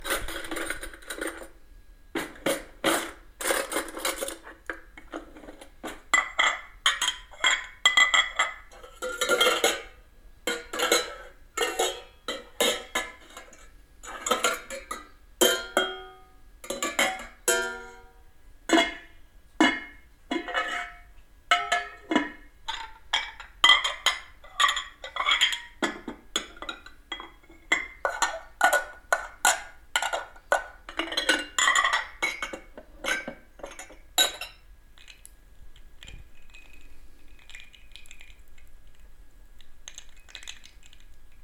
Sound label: Kitchen